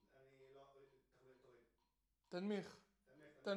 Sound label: TB GTR